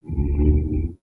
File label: Pet Buffalo Idle Look; creatures pets; Lego